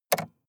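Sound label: sports car interior switch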